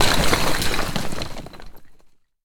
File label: Explosion Debris